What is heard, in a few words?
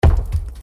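volcano collision; Blackeye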